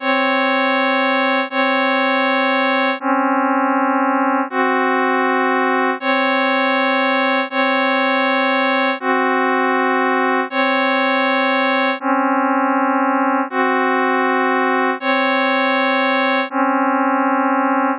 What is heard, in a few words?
dissonant